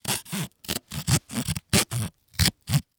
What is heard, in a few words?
Industry Tool Blade Saw Cut Thru Cardboard